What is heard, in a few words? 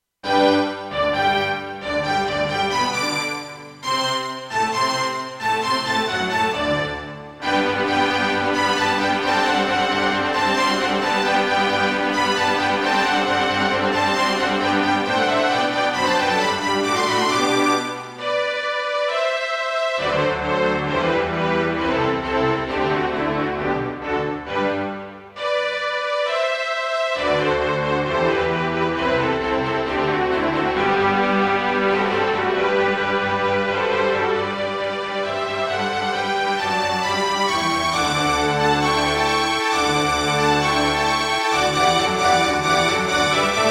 Gravis UltraSound Extreme; classical; Mozart; Eine Kleine Nachtmusik partial